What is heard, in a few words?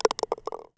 CUP